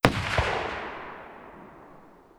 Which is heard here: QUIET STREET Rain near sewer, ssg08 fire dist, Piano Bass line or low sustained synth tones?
ssg08 fire dist